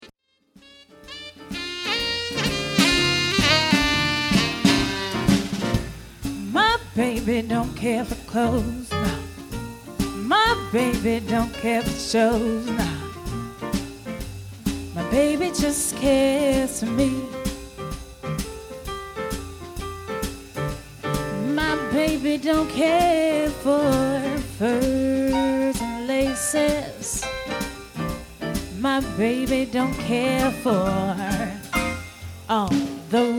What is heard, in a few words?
Combo with female vocalist, swing band